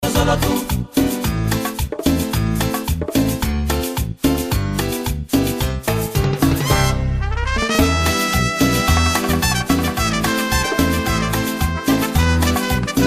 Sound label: solo tu cumbia